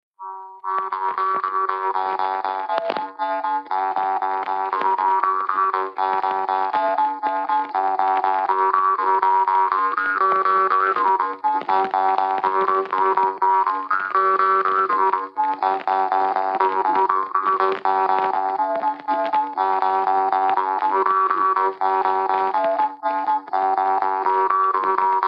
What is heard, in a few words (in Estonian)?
Polka parmupill folk; Laane-Nigula; Leikade Liiso